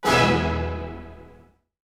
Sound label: Hit; ZERO G - Datafile DISC 1